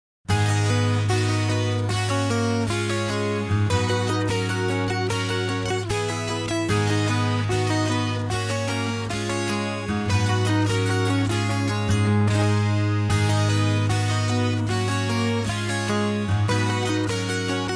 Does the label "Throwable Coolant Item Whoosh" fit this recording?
No